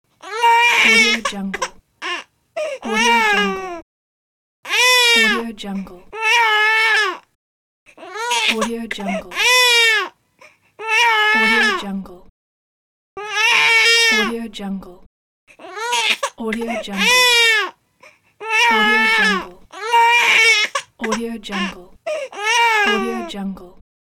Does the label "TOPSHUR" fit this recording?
No